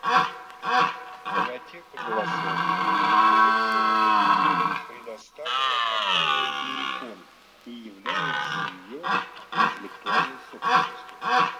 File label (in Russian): Олень Cervo europeo